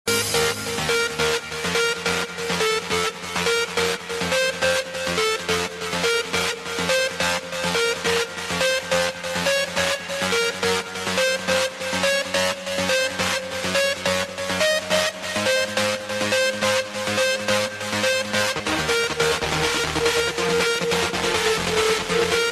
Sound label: adagio ringtone